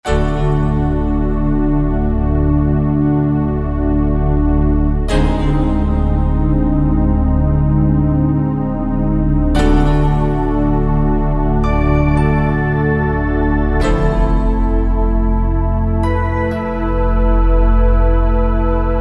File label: Radiate Pad; Factory Patches